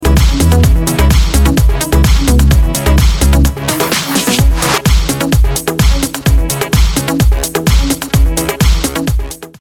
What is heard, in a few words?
break down